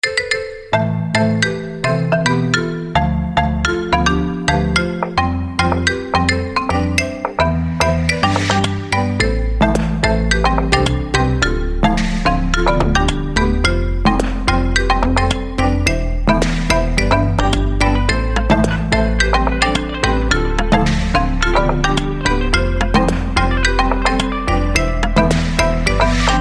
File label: iphone x marimbania ringtone